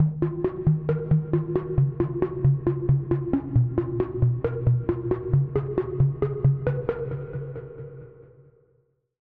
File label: Pluck, Sylenth1